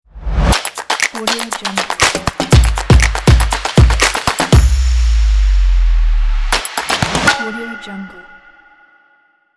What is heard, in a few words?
upbeat promo intro logo